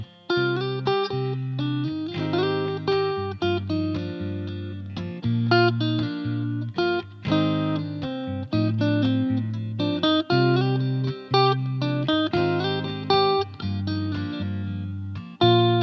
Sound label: Loop